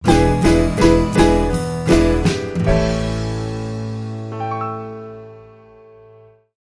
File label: Cornbread Blues